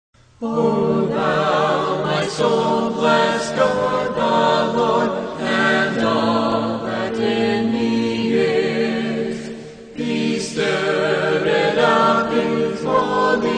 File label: choir; psalm103scottish